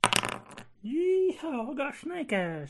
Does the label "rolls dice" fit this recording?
Yes